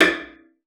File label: CELLO FINGER; 90 s Sample CDs; Xsample VOL-5